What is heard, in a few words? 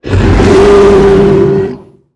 Monster Electrocuted; Monster George